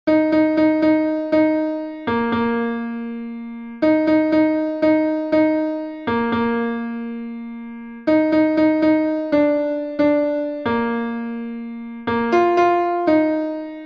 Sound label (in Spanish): CONTRALTO, himnos en piano; cantos del camino